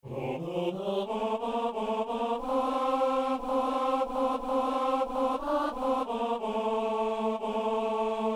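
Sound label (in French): psaume ténor; Psaume 116 (117); Messe du 17 janvier à Saint-Denis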